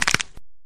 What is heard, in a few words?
bone crack meme